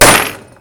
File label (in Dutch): Hamer